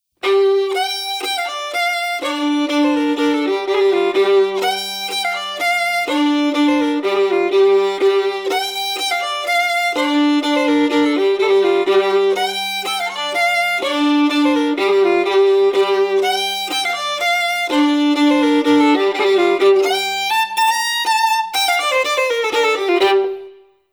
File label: FIDDLE